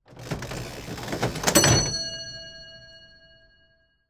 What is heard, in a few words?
Ship Bell Wheel Ratchet And Ring